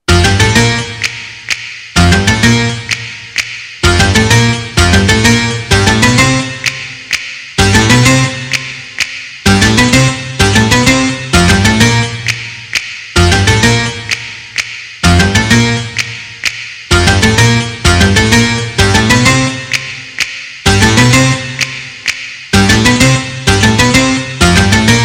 Organ; Adams Family

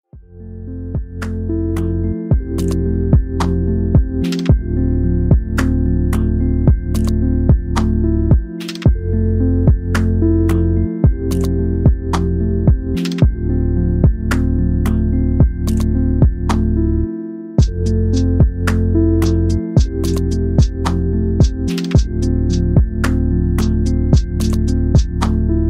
JazzHop

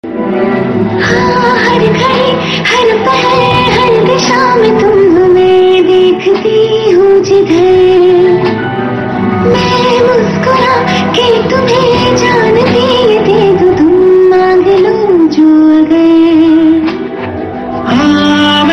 Thank U Sad

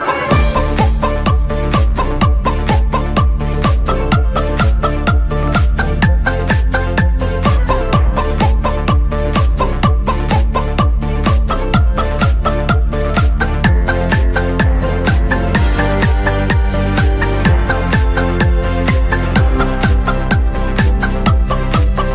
Techno Chicken

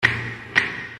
snaps

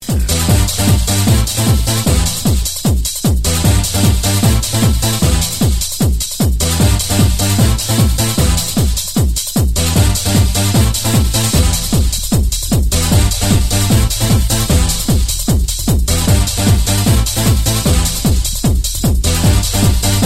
Techno

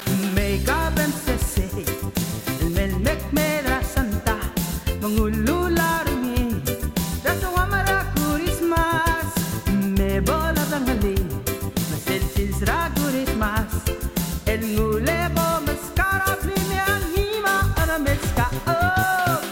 Bling Bling Bling

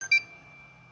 XBOX 360 Start Up

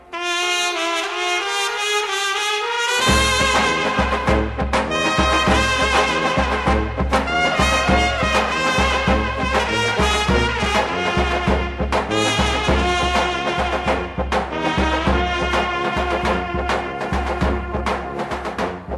trumpet; Serbian condor

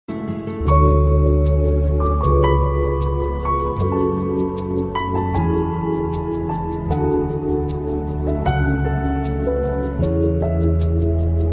Soft Music

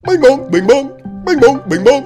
ring

